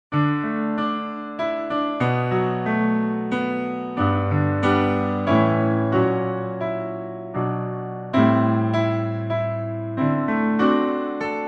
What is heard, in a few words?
Piano Solo, Original Key D